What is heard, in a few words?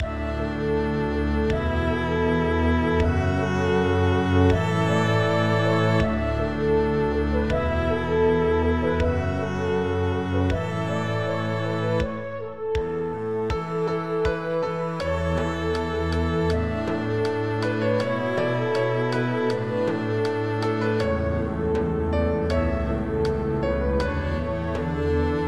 drums